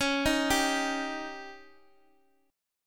C sharp Suspended 2nd Flat 5th C sharp Guitar Half Step Down